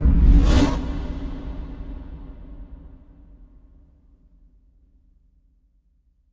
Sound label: impact flame, Cinematic Impact